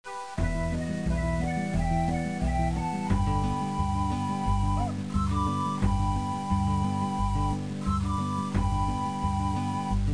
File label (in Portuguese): trilha; GEOGRAFIA; peru